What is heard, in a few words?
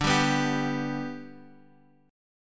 B-Minor Sharp 5th